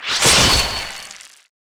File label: impale target; weapons; dota; kez